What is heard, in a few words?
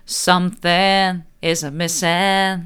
mid, vocal